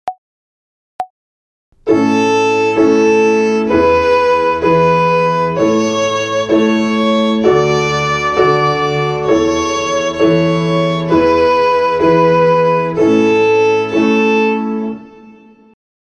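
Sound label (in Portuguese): Ginástica até o dedo 3 - Corda Lá - Binária, Violino; Violino para os Pequeninos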